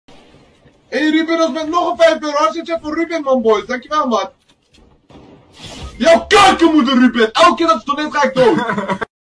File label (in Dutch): Turkse muziek